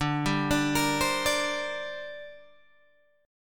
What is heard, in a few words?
D 7th Suspended 4th Sharp 5th, Guitar, Open G